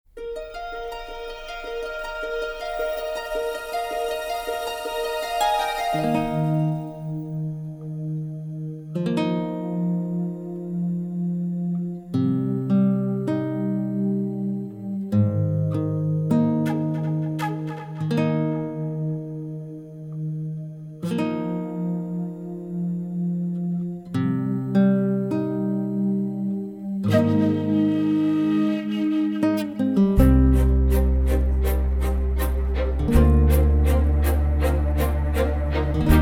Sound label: without vocals; Sing for audition